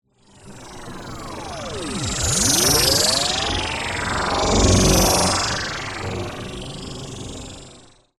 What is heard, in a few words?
UFO Pass By, Scifi, Ufo, Pass, By, Vintage, Science, Fiction; Spacecrafts; BlastWave